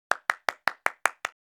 Hmn Clap

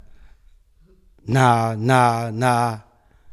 painful

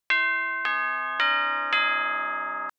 BELLS

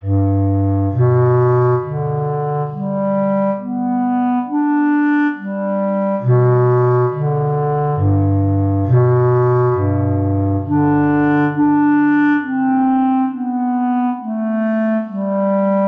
Bass; Multitrack Western Instrumental Classical Trio Dataset

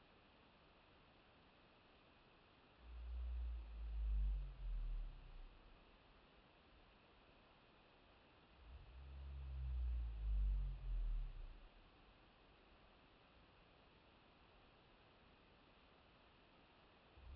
calls

